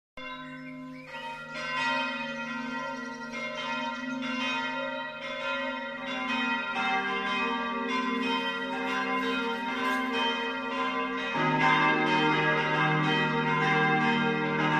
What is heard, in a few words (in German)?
glocken